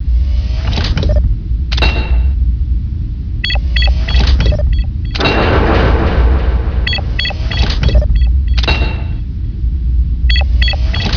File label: ambient map